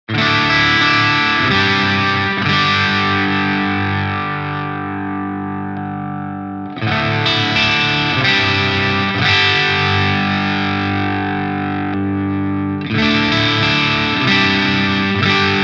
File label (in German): Yamaha Revstar RSS02T fünf Stellungen